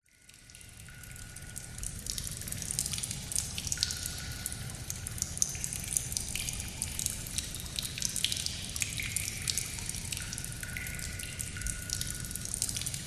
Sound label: nature cave ambience water drips splatters subtle wind howling distant; zapsplat